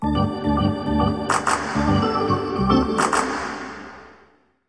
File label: Hockey Organ